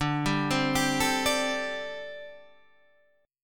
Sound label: D 7th Suspended 4th, down, Guitar, Half Step Down